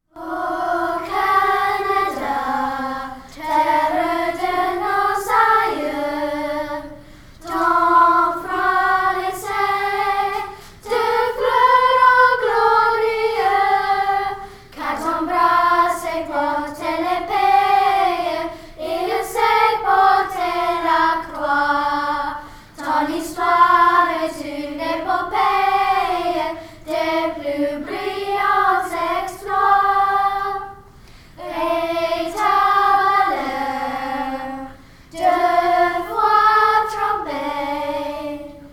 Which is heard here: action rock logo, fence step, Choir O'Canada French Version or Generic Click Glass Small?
Choir O'Canada French Version